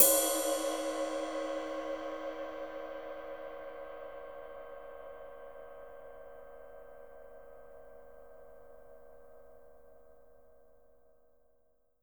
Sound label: ride cymbal mf, drum kit; unpitched percussion